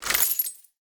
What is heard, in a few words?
ui coin